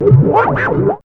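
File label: SCRACH, scratch; E-Lab; X Static Goldmine 1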